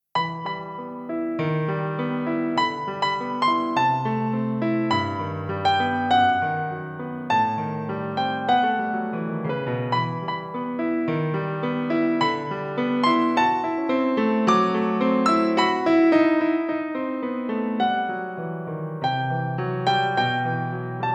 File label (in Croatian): tamburaski orkestar i klavir; za tamburaski orkestar i klavir